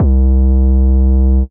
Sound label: C Bass